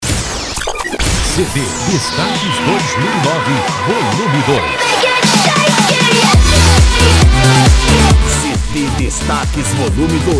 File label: electro house dance